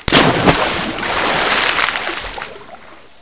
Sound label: SPLASH, Water